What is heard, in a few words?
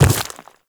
Quadruped Medium Land Default, Footsteps; Warframe; Infested